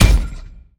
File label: Big Hammer Hits